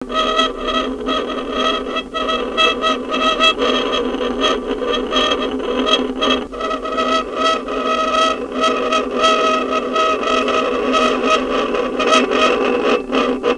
Violin Extended Technique

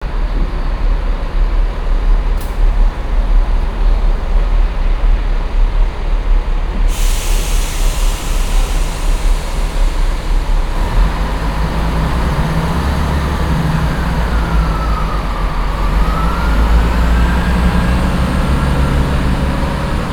Station; Liverpool; Sound Scapes

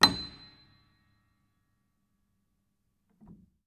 Note High, Piano; Piano Miscellaneous

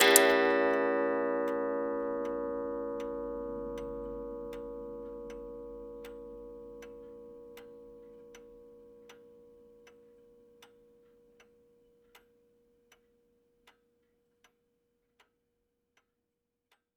Antique Chime, Clocks

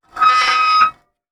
Soldering Iron Stand Drag

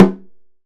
Snare Drum; Percussion Samples

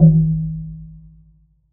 Cello D Bass

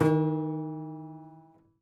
Prepared Piano

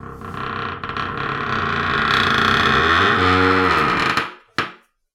Door Creak

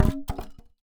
Polystyrene Rattle

